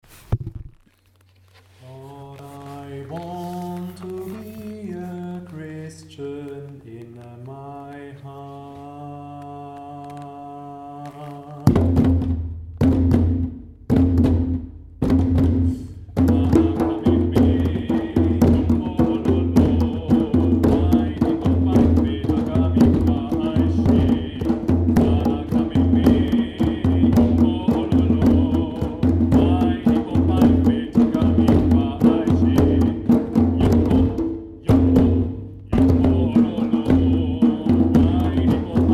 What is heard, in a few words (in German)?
Trommeln; Kana